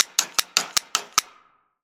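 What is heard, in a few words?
scissors effect, fast cutting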